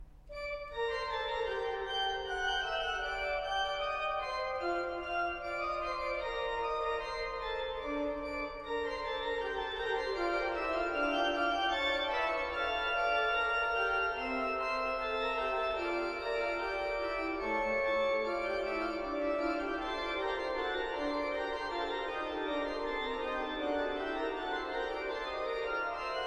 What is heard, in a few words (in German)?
Fantasie und Fuge g moll